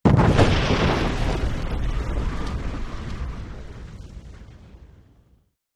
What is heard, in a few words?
Explosion Rock Debr, rock & sand